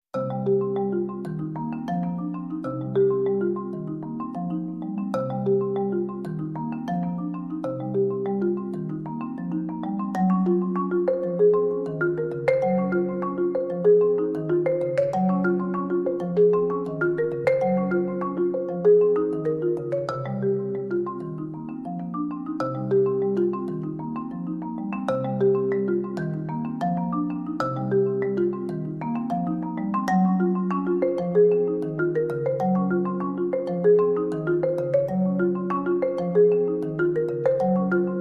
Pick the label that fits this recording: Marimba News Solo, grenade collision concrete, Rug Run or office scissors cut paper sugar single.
Marimba News Solo